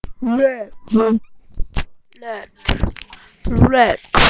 rat

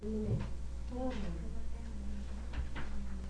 moan

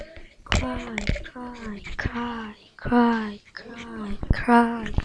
cry

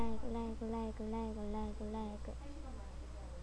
laugh

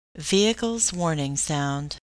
horn

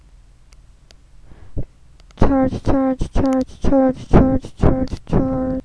charge

